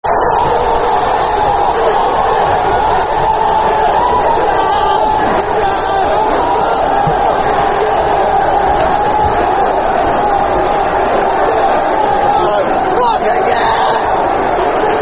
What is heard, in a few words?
Cheltenham Score, Football Chant; Soccer Songs; Cheltenham Town Ctfc